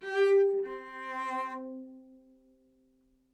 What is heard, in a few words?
live string phrases, string phrase Cmaj 100bpm